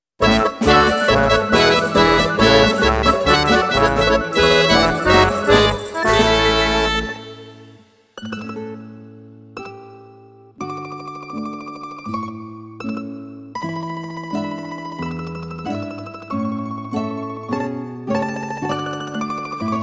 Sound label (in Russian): РУССКИЙ НАИГРЫШ (русская мелодия)